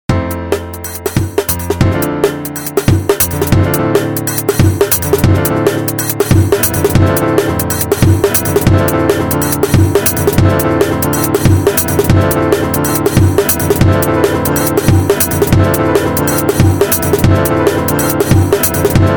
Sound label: PIANO